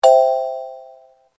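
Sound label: correct answer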